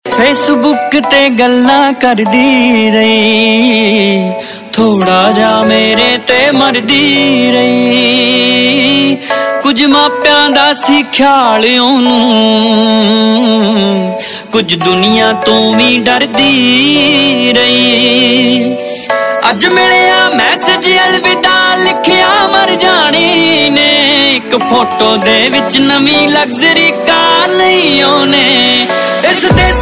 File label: Ringtone, Punjabi